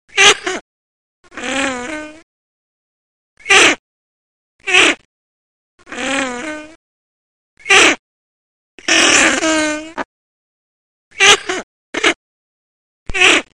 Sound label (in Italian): Moriglione